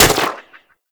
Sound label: Speeder Rifle Fire